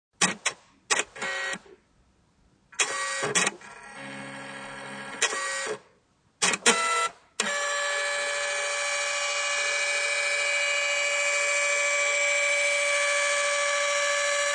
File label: Scanner running; Computer Sound Effects; Electronics